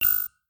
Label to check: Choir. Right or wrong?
Wrong